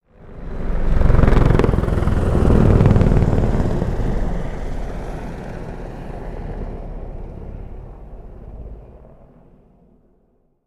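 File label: Apache Heli Pass Over; AH-64 Apache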